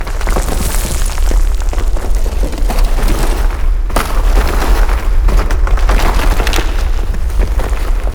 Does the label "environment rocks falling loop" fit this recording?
Yes